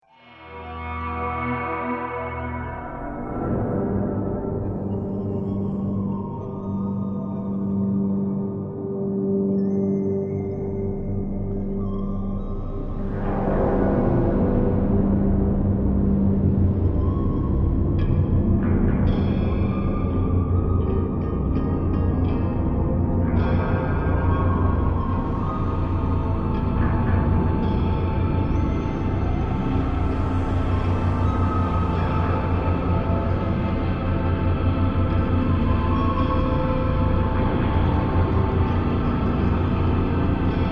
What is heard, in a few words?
Drone Horror Musical Tonal Metallic Tension Dark Mysterious; Scary Soundscapes (Sound Effects:Horror)